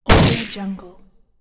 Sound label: rifle shoot